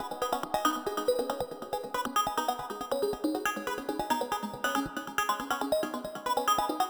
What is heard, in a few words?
FM Synth 139BPM, G#minor; Melody & Synth